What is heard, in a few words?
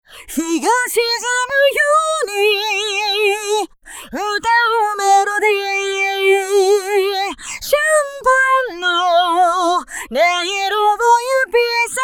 phaser stage 2